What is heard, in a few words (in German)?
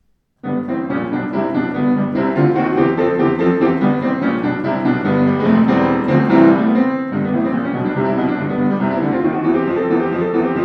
Schimmel Rag, Klavier; schimmel 106 eiche